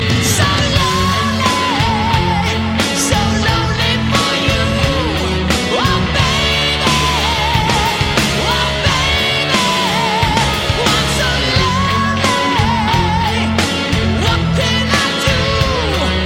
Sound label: Slowrock